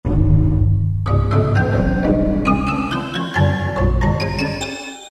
Gron Percussion; Roland PN-D50-02

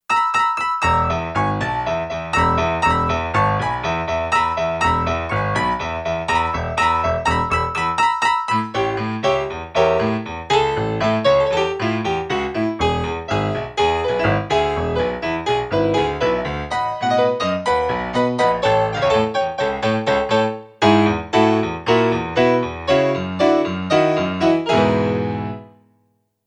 Rock Piano, Kurzweil K2500X; Factory Programs

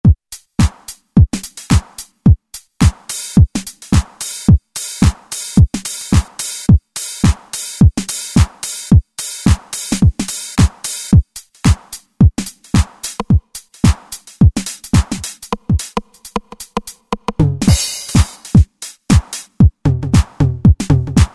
Drum Set, Roland SR-JV80-06 Dance

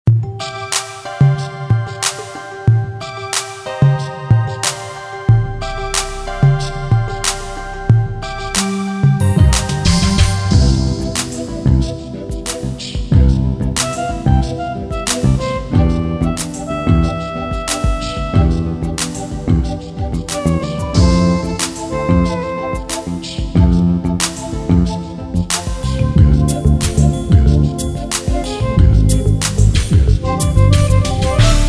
Soul Ballad

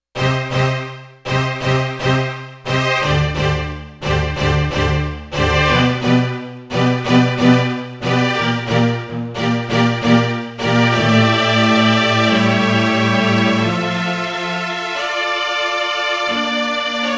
Strings

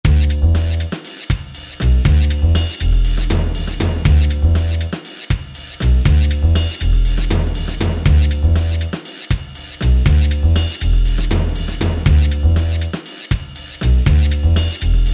Fusion

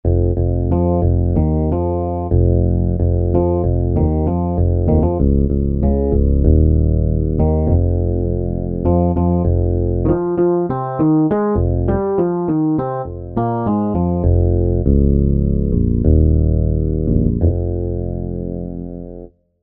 Octave Divider, synth

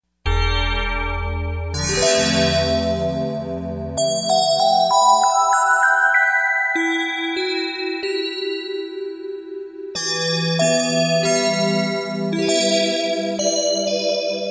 DigiBell, Yamaha TG55; Factory Presets

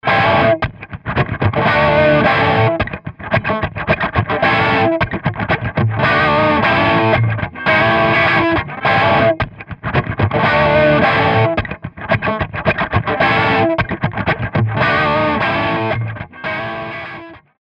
Classic Rock Guitar; Apple GarageBand 2; Apple Loops

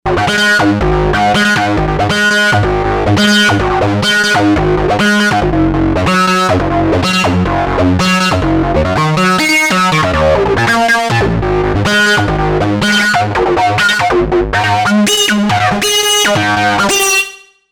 Filt Dis Arp, Novation UltraNova; Factory Patches

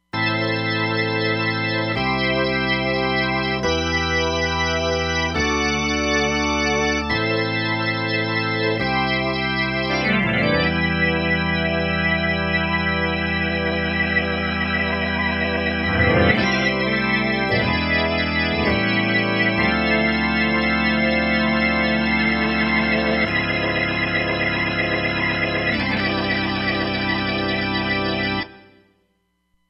FullStop Org, Roland Fantom XR

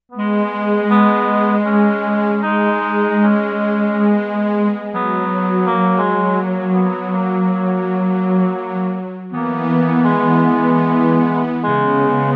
Legato Reed

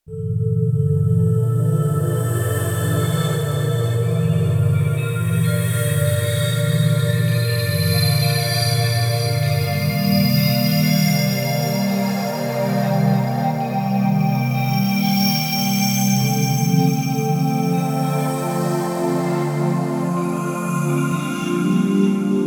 Roland JD-800; Factory Patches

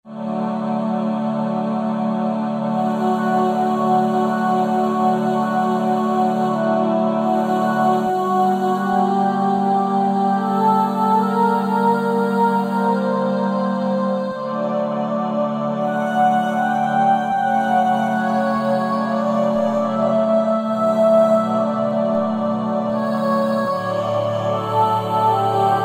Choir, Roland V-Synth; Factory Patches; 151 Choir&Prayer

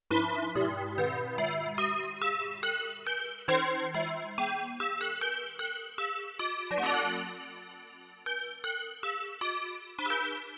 DX-Bell Chorus, Yamaha TX16W

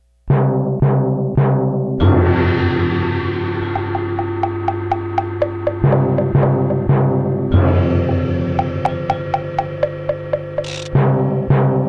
orch percussion

